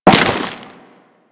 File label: Crewman Step Dirt, Footsteps; Warframe; Corpus